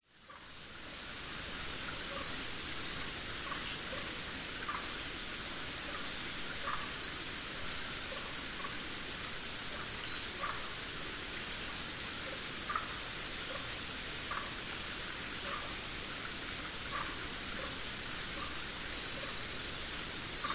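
nature rainforest creak stream flowing tusked frogs Queensland australia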